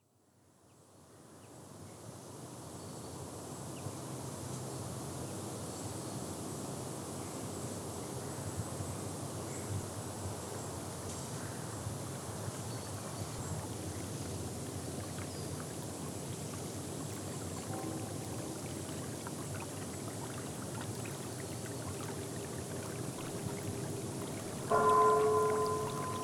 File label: Rain & Bell